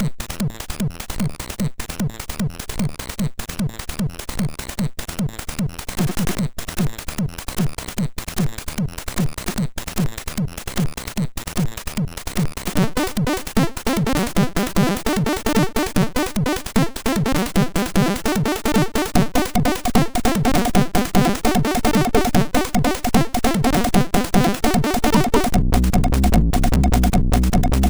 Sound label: House, MOS6581R4